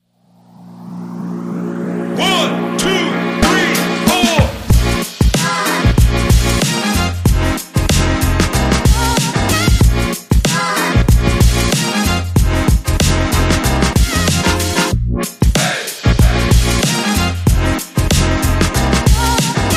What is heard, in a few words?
the podcast intro